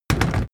hard plastic case put down

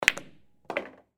billiard hit into pocket short

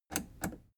plastic button scratch click enable slow

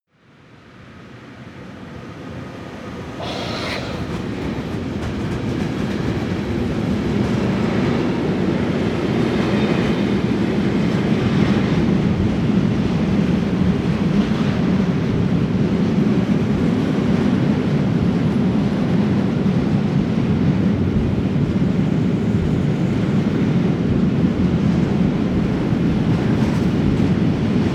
train freight drive by

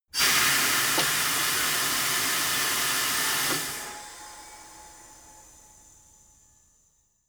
tire changing machine hydraulic out

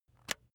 razor attachment retract soft

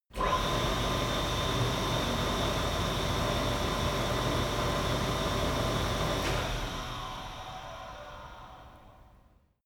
flue gas installation start running stop

